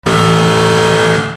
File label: deep buzzer